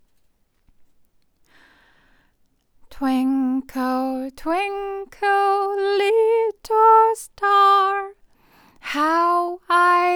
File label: Vocal Close